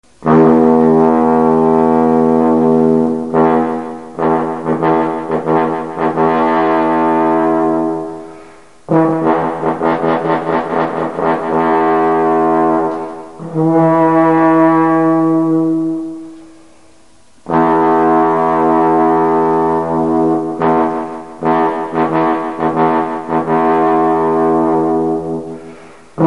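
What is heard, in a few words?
call to hounds, signals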